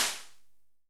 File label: hit